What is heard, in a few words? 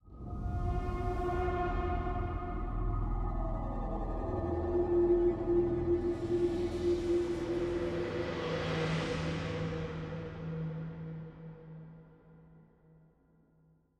Start 125bpm; Legends of Pegasus; windows gamerip 2012